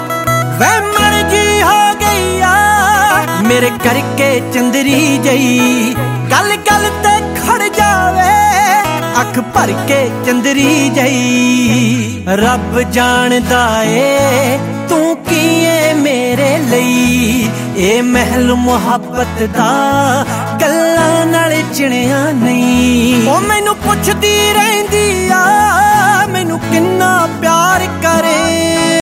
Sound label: new punjabi song ringtone; bollywood